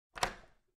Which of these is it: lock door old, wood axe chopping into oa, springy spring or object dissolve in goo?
lock door old